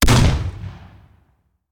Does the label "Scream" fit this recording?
No